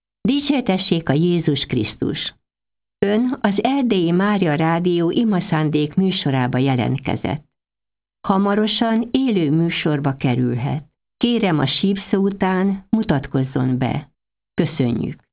Jingle Maria Radio

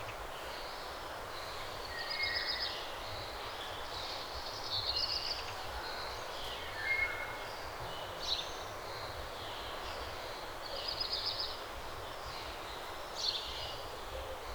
morning and birds ambience; Sound Effects; Karacsonyi tortenetek

